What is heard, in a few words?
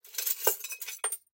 Glass Debris; SoundsCrate